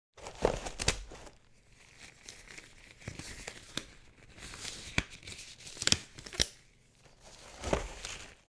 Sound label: Rubber gloves latex surgical gloves being stretched